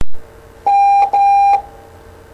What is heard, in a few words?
Morse M